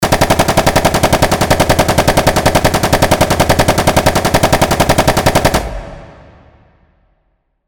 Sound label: Rifle Automatic Fire